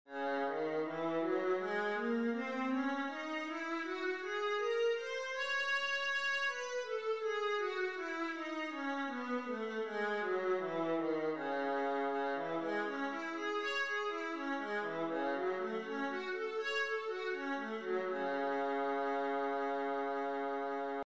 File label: viola c sharp minor, scales